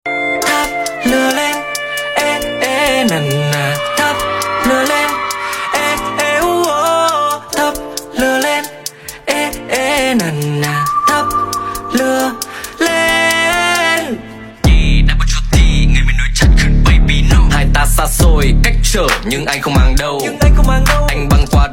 rap

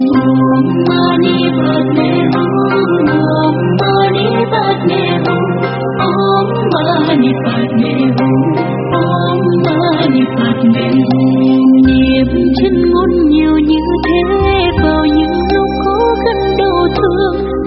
nhac chuong om mani padme hum than chu

